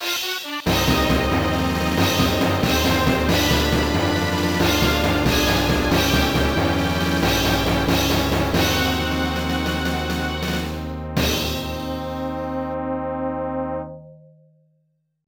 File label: Area Clear; Sengoku 2; arcade